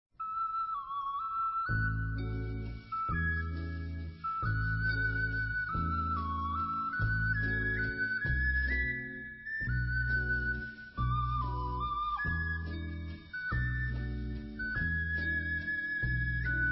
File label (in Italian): Sentimantale (Versione Ocarina); Quando Le Donne Persero La Coda